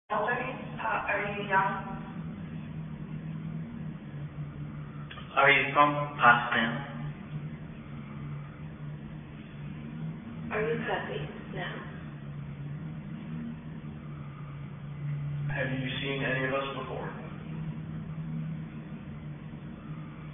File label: tower breathing